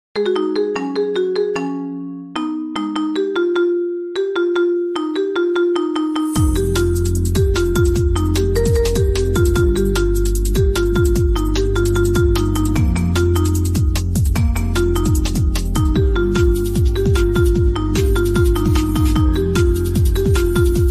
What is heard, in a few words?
marimba remix ringtone